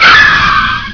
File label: SCREECH